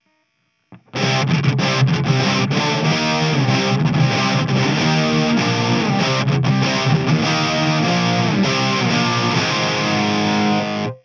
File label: ampeg vl102